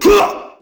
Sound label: fist voice; svencoop; rngremake